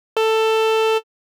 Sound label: FILTER Formant; Pigments